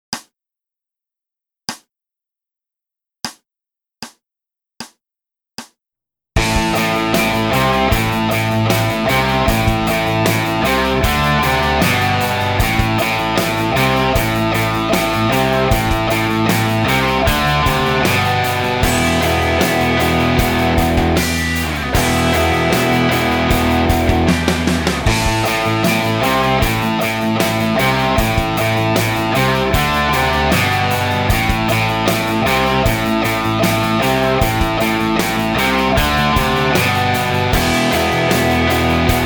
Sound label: backing track